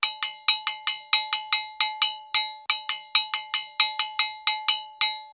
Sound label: ETHNO EXTRA 90; ethno2